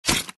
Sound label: Ink Hurt; Fancy Pants World 1 Remix